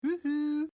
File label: Who Wooo